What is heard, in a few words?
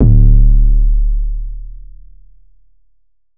808 one shot D; Slate Digital BASSHOLE Sample Pack